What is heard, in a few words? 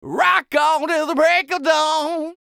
ROCK, MALE HUMAN; ILIO; Vocal Planet